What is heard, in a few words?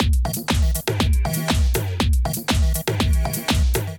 Neon Sneaker Kit groove 120 bpm